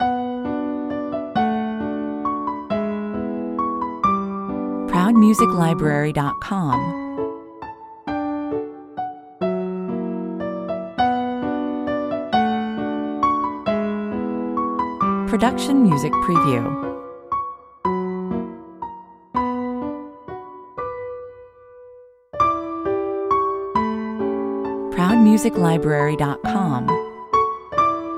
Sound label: piano solo, 133 bpm, somewhat slow and deliberate; the little angel again shoots his love arrows, somewhat slow and deliberate, but still a catchy tune